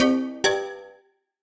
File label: Attention Free Spin Double; netent; happyriches